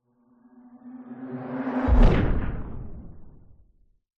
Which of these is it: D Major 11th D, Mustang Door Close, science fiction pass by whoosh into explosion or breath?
science fiction pass by whoosh into explosion